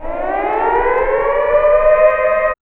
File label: SIREN